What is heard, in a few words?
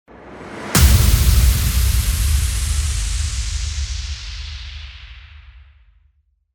IMPACT